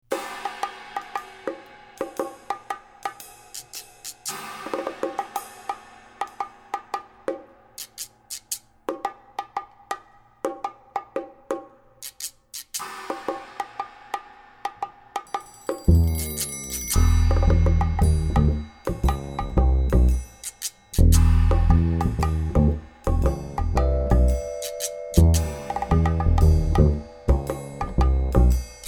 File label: jazz; ROMANTIC COMEDY parking lot